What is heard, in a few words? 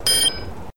killsound; HitAndKillsounds; other killsounds